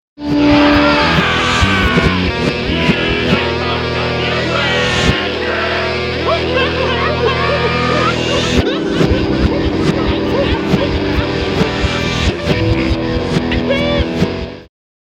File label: Backwards